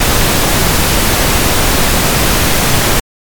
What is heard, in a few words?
noise pink